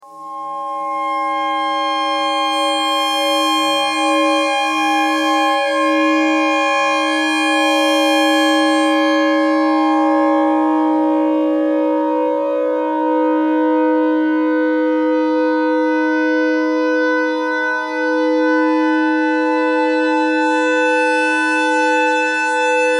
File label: clarinet notes e thread noise